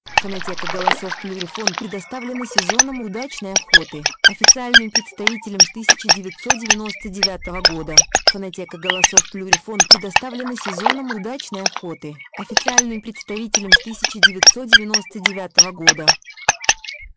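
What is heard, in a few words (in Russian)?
Лысуха, манок; plurifon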